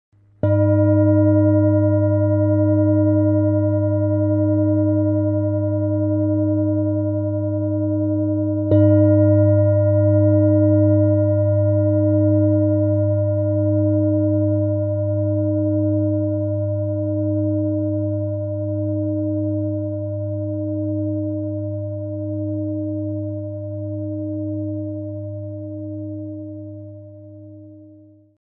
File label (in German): Klangschale